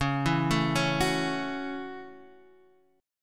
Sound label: F sharp Minor 7th C sharp